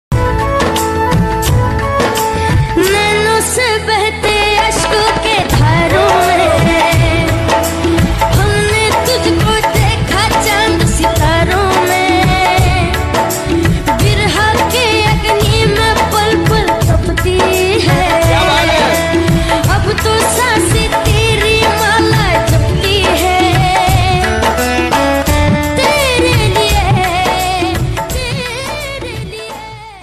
lofi ringtone flute ringtone soft music ringtone

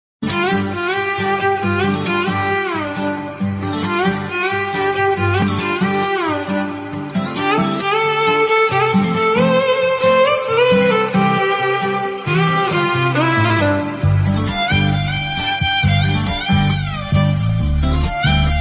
love bgm instrumental bgm ringtone; kumari 21f; south

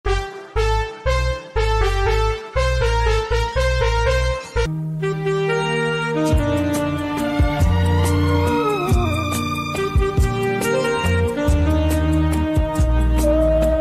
romantic ringtone, instrumental; aishwaryarai; abishek bachan

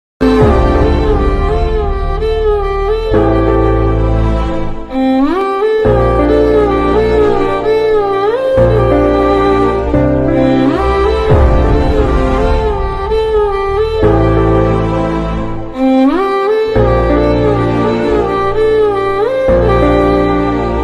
sad bgm ringtone; jersey

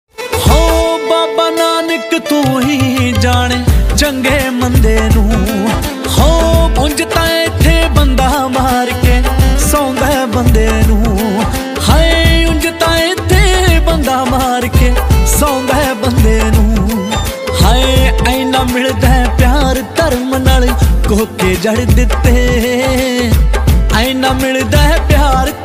punjabi song

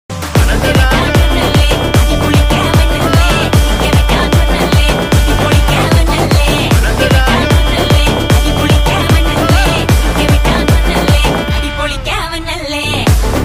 vettaiyan bgm; dance

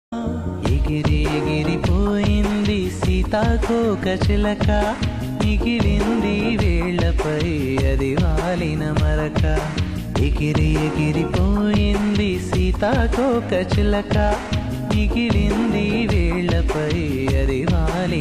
love ringtone

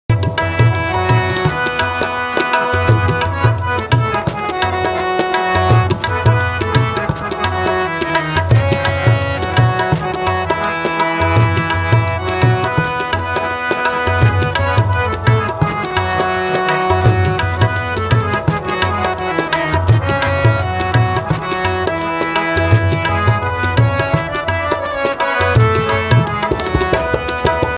music tabla harmonium instrumental

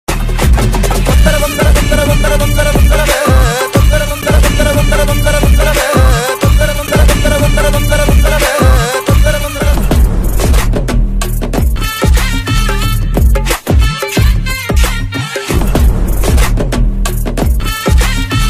trailer bgm ringtone; guruvayoorambalanadayil; prithviraj

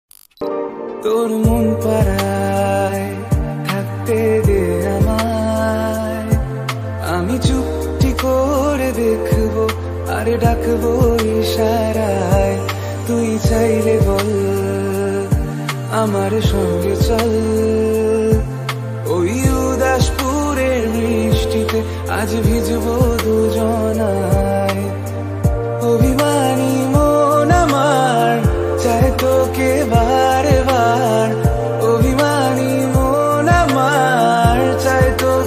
bangla ringtone bengali love ringtone

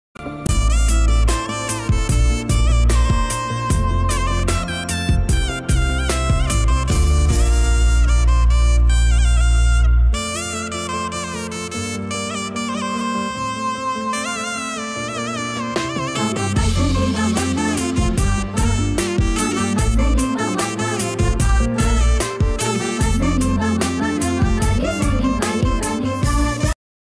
most eligible bachelor climax bgm

